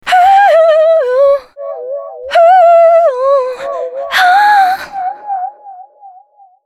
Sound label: female c#, Dance Ultra Voice FX; Mutekki Media